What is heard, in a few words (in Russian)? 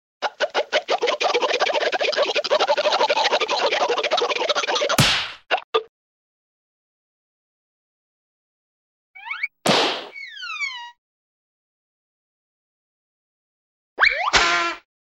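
удар по лицу пощечина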